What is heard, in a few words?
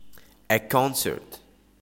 A concert